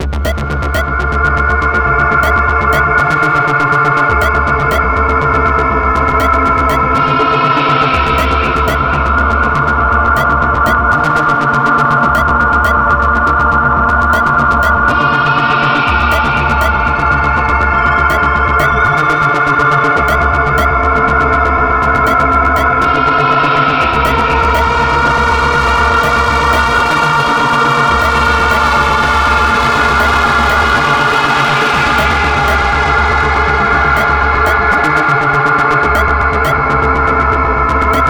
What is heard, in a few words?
You Died Loop